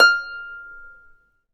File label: F5 HARP; Complete Harps; USB Soundscan vol.62; AKAI